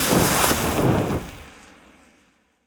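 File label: weapon thermite loop end; gmod; viper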